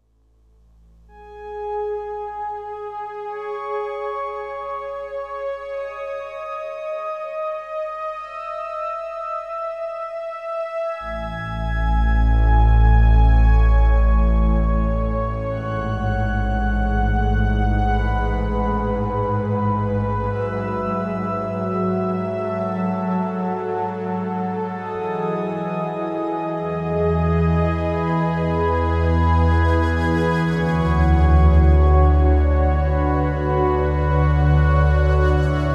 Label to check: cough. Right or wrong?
Wrong